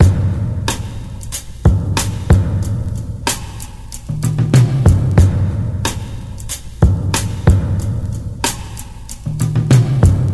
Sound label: 93 bpm drum loop f sharp key, Free drum loop sample - kick tuned to the F# note. Loudest frequency: 1081Hz